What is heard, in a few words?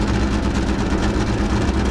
EXT Idle, Vehicle; NASCAR Thunder 2004